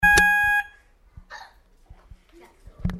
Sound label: beep